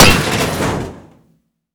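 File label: destroy cigarette tray; Explosions; Call of Duty Modern Warfare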